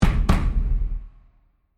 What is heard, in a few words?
2 taiko hits fast; JSO elements